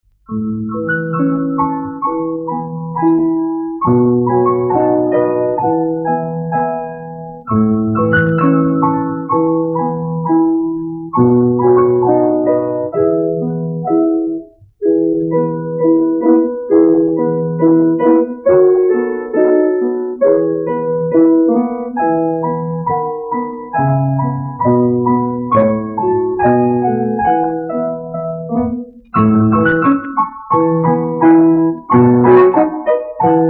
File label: fortepiano; detskie